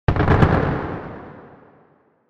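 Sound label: shot burst in space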